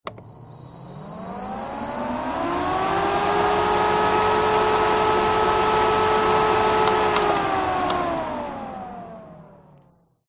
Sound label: air cooler electric on run off short rear pov inlet; zapsplat